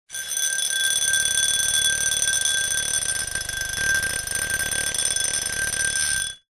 foley glass electric motor vibrate against glass rattle